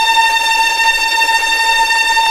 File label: STR TREM, Vlns Tremolo; Roland - String Master Series